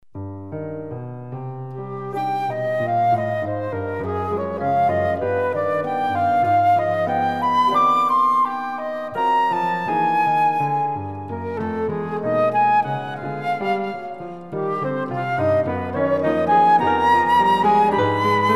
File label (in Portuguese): Choro; brazilian instrumental music